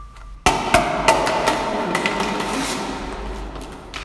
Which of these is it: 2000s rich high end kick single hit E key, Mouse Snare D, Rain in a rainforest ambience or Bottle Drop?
Bottle Drop